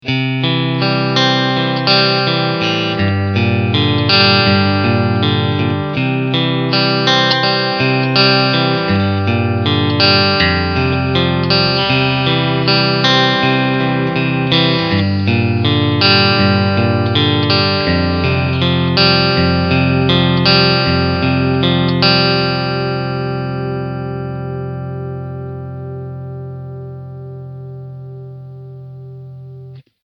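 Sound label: Middle SC Cleanpicking, guitar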